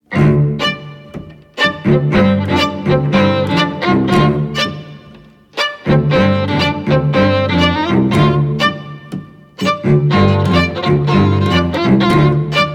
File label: String Quartet